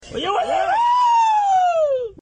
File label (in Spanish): grito huaso